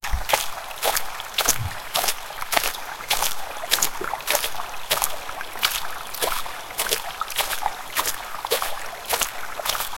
footsteps

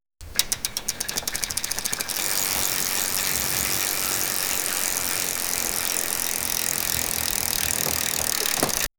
old bike bajaj m80 bike

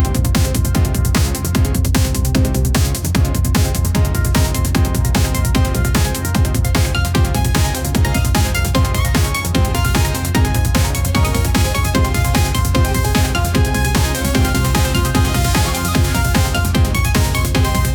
upbeat action hack and sl, chop

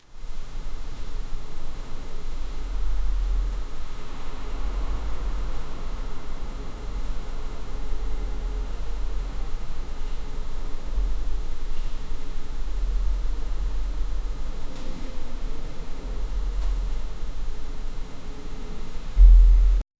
the quiet atmosphere of a laboratory

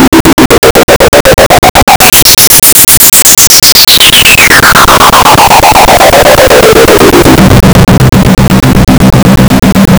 the effect of like and, social media

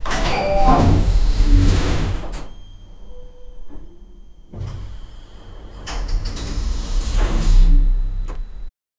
a modern electronic e lux, elevator

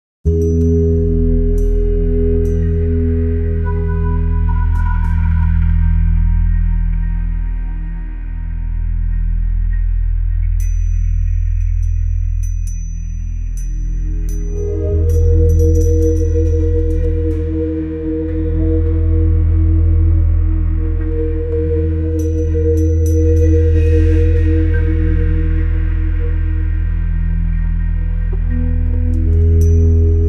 deep ambient soundscape, bells

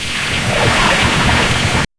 a sail being deployed in; 19th century

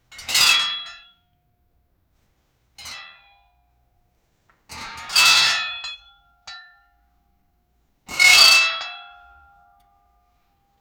old metal lattice fence; man made

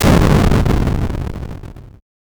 16 bit cannon destroyed

